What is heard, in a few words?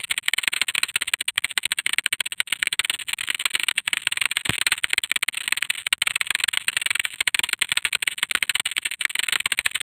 a heavy greasy mechanical